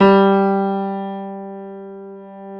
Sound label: PIA PRO, Roland